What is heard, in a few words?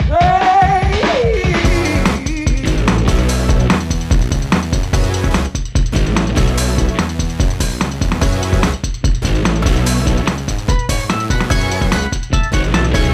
Bass Drum